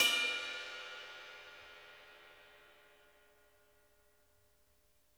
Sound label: bell, drum kits; Sonic Reality